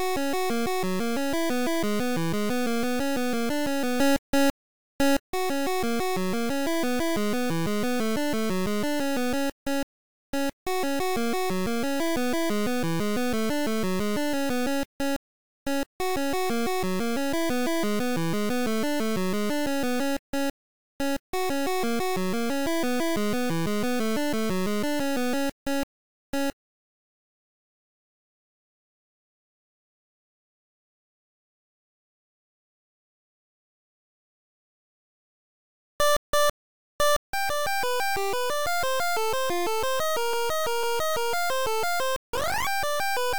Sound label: Arpeggio